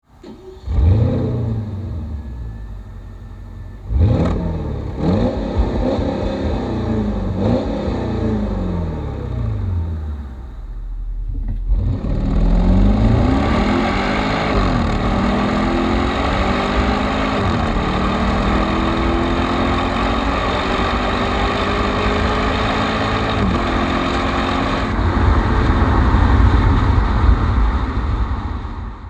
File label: Porsche Cayenne Turbo